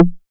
808 SD Tape Sat, Snare Drum; Goldbaby Tape808 & Tape909

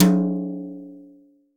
TOM, CTD TOM BRSH

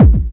KICK PRO -L, Disco House

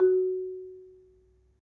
Marimba, F#2; Terkelsen

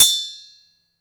Steel Bowl, household rhythm samples hits

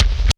REV KICK

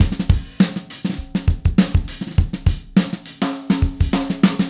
Acoustic Kit, Drums & Percussion

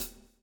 SWNG

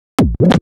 Scratch Fills 140BPM

